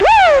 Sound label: woo